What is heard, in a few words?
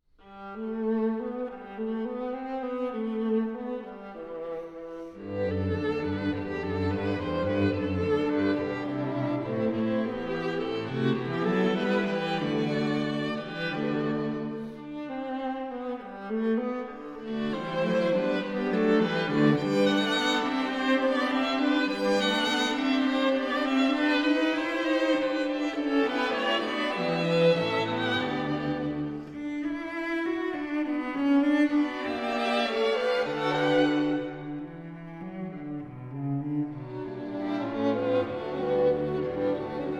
String Quartets No. 3 In G